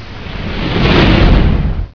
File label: whoosh; Dilbert